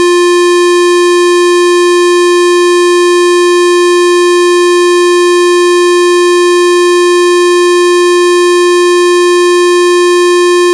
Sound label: 351 SQUARE; SQUARE WAVEFORMS